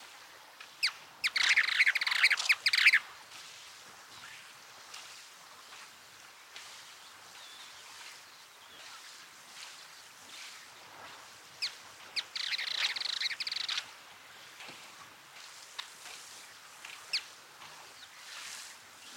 Caspian Plover Charadrius asiaticus, caspian plover call